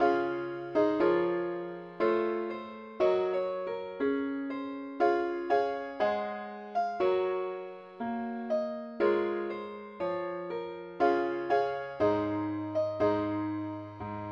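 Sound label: ukelele key d flat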